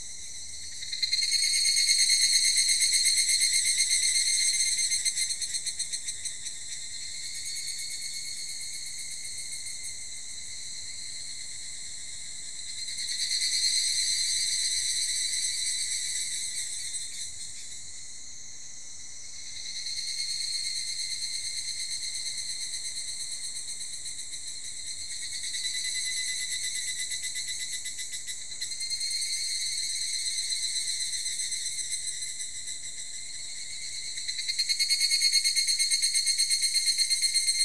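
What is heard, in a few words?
evening cicada; higurashi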